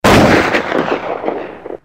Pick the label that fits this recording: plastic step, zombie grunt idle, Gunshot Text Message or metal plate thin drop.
Gunshot Text Message